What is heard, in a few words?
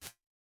Reverse Gate